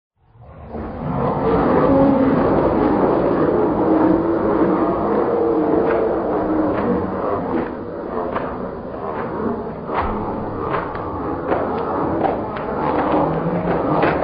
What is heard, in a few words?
haha